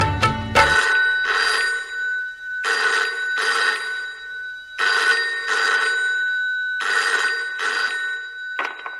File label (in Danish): gammel telefon, ringetone